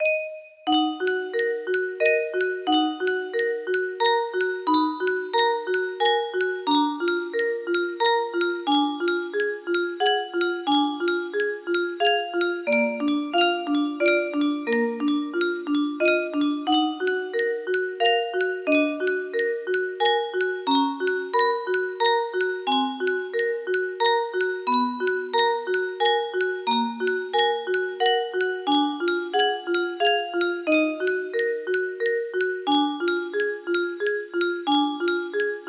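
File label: slow in Eb, music box melody; mothers lullaby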